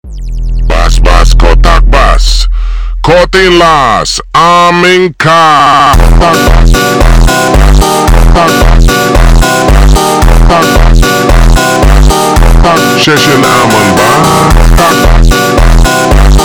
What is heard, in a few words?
FUNK (SUPER SLOWED); Фонк; Тик Ток